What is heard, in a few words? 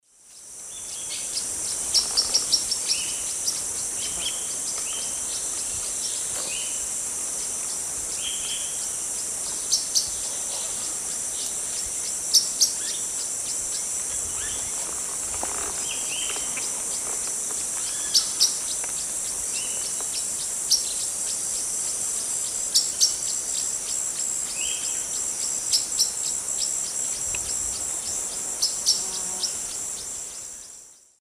Forpus xanthopterygius, calls; S. America; Eastern Lowlands